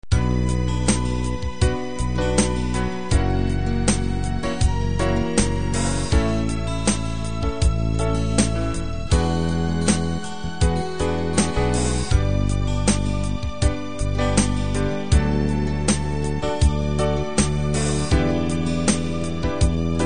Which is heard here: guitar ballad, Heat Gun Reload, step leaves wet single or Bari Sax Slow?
guitar ballad